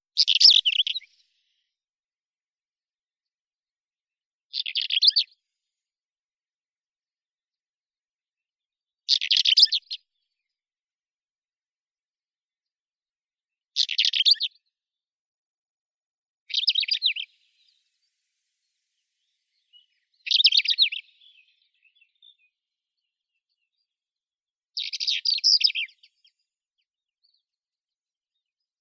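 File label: pied wheatear, Oenanthe pleschanka; kamenka pleshanka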